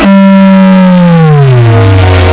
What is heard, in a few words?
fire